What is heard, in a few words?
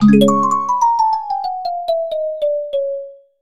Game Over Jingle; Good Pizza Great Pizza; Android iOS